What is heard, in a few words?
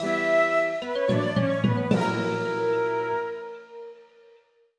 Secret Spot Failure; hey pikmin